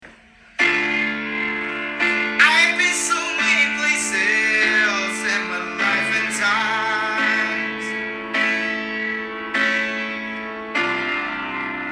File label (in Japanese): ベルティング歌唱例